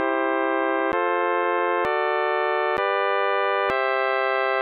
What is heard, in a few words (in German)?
doppeldominante